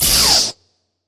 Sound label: lightsword blaster block; soundscrate